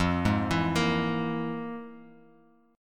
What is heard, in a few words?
F Minor Major 7th Double Flat 5th, Bass Standard; FmM7bb5 arpeggiated; JGuitar